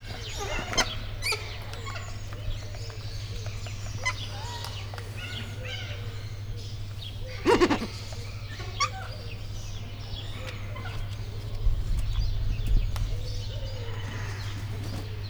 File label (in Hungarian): csőrét fának dörzsöli, ezüstarcú trombitás szarvascsőrű; állathangok; nyíregyházi zoo 2010